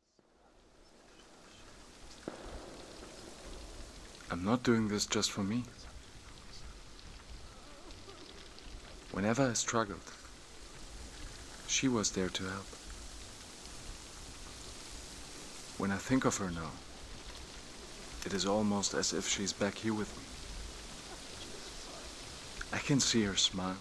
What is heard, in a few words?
shelter soundscape; Shelter exhibition, Adlerstr. 32, Karlsruhe, October 2023